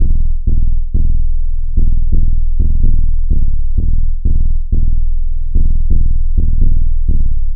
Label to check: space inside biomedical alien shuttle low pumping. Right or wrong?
Wrong